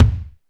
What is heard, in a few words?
DM PILLOW, KICKS